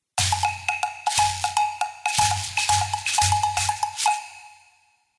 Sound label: Hand Percussion Ensemble